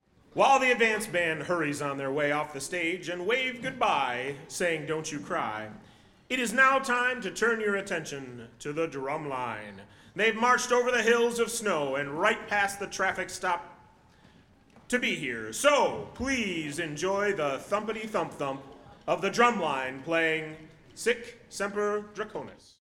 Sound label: Drumline Intro